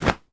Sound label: household pillow drop on floor; sound effects one